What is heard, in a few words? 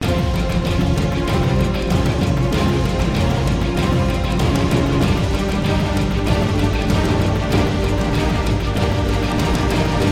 oilrig suspense music; Call of Duty Mw2 2009; game soundtrack